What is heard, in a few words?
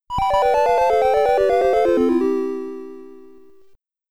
Unused (SID Stereo); Marauder; Commodore 64